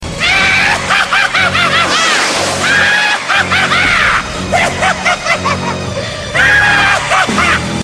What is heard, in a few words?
Evil Laugh